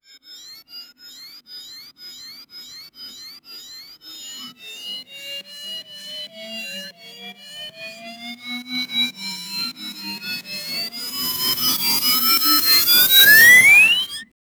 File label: medieval robot power up